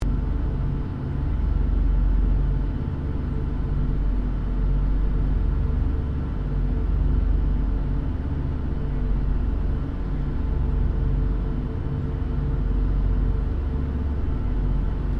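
AIRCRAFT LOCKHEED L-1049 SUPER CONSTELLATION FLYING INTERIOR; 1955; Connie, Super Constellation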